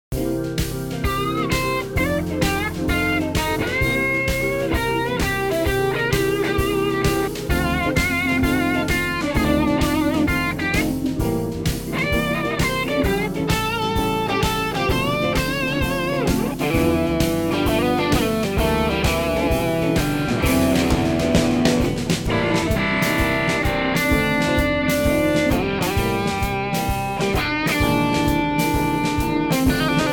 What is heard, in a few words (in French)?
aventure blues